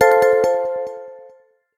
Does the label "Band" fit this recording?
No